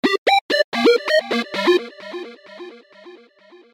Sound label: SMS phone